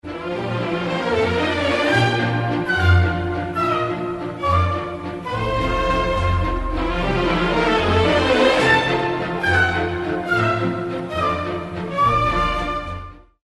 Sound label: strauss voices of spring